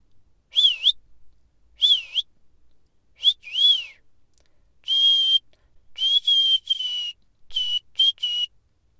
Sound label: Whistling